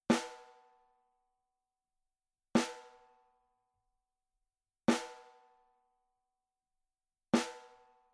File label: SNARE ST Dry Edge Soft; evans; naciagi do werbla uderzany